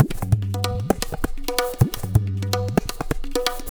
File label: Pure Tabla, 130BPM; Zero-G